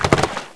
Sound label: water clip in, weapons